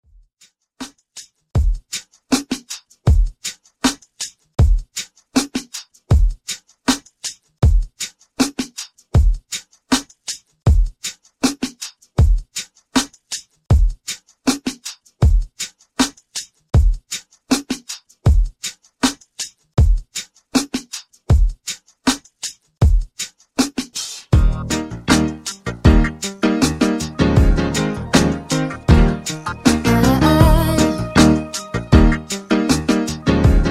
Mixshow Intro 79 Bpm

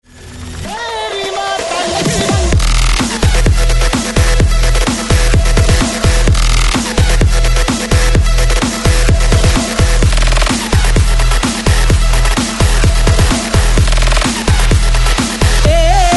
128 break mix mashup; DJDOLLS

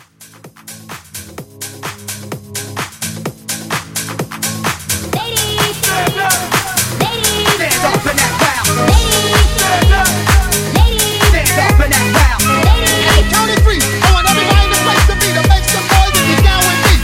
Club Hype Edit, 128

